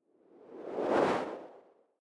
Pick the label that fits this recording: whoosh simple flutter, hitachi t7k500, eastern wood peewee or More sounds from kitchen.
whoosh simple flutter